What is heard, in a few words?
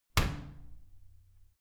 washing machine slam door